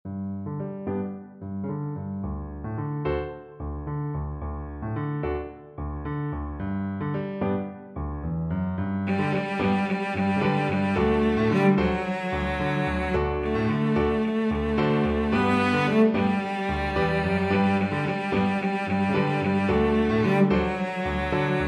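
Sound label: violoncello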